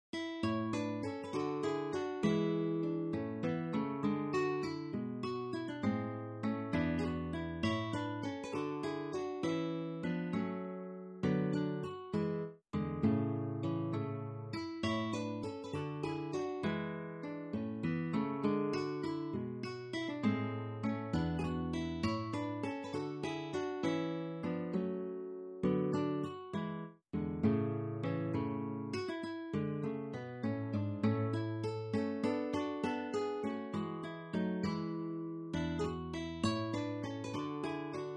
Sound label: divertimentos; horetzky felix